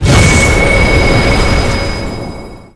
Ray

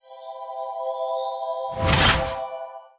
Cube Composition

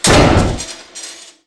statue make end

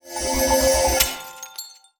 Cube Decomposition

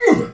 pain; helroid

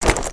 put armor

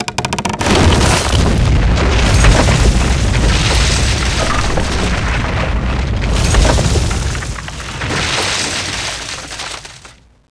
log

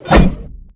swing

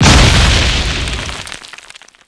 Ice

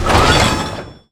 Robot Spin

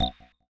pet sleep

